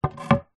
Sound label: wood plank down on concrete; foley; zapsplat